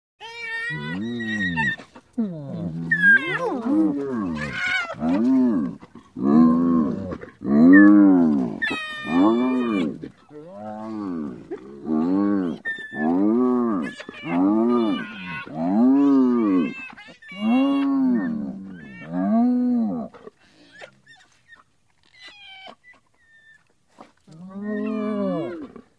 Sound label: Hyena Spotted Whines